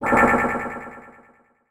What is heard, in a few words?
Title Transition Delay Short